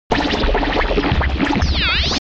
science fiction space earth miserable radiofrequency connecti